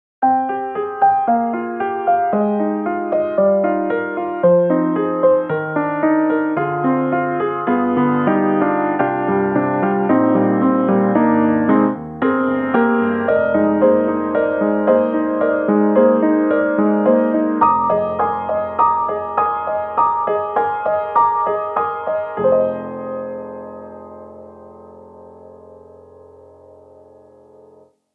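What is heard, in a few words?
Modern Upright, piano; XLN Audio; Addictive Keys; Time Files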